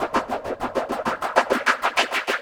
Echo Chuff Splat, Synthetic Pulses

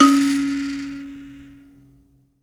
PLK BUZZ, Buzz Kalimba, Africa; Roland LCDP11 Africa VOL-1; Buzz & HiBz

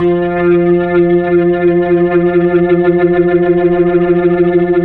HAMMOND; 3D Sound; 3D Keys VOL-1

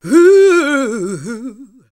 GOSP. Singin; Sound & Vision - Gigapack II CD 1 (Roland); HAL Gosp.Singin`

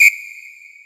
Whistle Short; realdrum

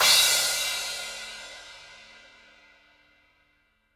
DW Red Maple Cymbal Crash; 2002 DW Red Maple Kit; One-Shots; Sonic Foundry (Sony Creative Software); Vital Drums

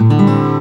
STL STRNG CRD; Best Service; World Colours

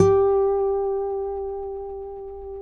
Finger G 4 R, Guitars; Spectrasonics; Hans Zimmer Guitars VOL-1

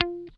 GUI F4, E-Gui Muted; Sound & Vision; Gigapack I CD 2

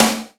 SD, Yamaha RY-10, Drums

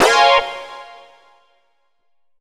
Lead A#2; Zero-G Club Techno

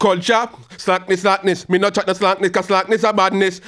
G-PHRASE, RAGGA IN G

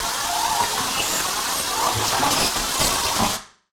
Casio, Glitched, malfunctioning synth

